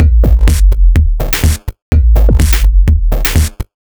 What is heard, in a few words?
Feel My 54hz, Drum Loops, 125 BPM; Sonic Foundry (Sony Creative Software); Underground UK House & Electro